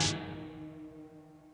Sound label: Smack, Perc; Lost Tapes From Mars